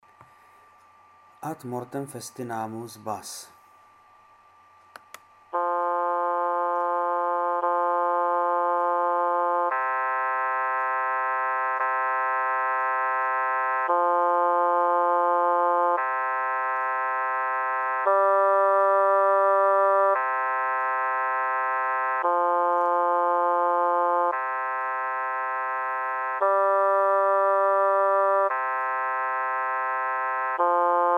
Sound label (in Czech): ad mortem festinamus bas